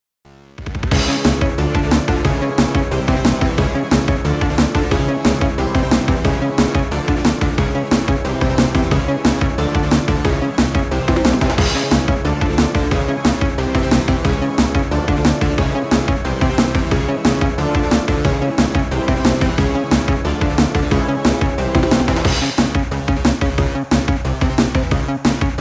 IP (Instrumental); Friday Night Funkin Sonic Has Your IP Address 2022 windows

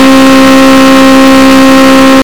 exige on high

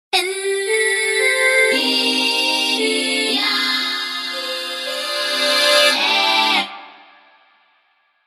Defeat Announcement; Ar tonelico: Knell of Ar Ciel

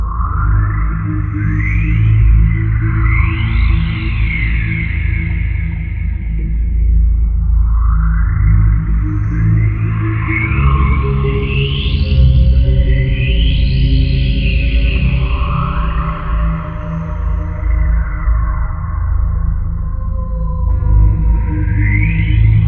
Lake Loop; Jitsu Squad; ps4 ps5 switch windows xbox one xbox series xs; Realmofrogue

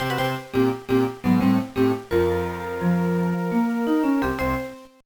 Defeat

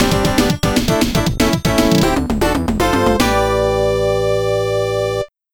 Jingle Zone Clear

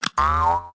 Ball Noise; lego my style preschool

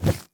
flap, Flying Insects

